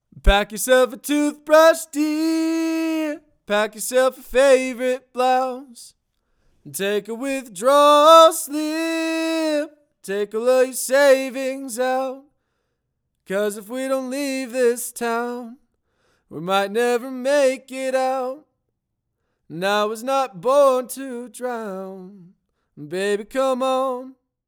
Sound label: Vocals Treated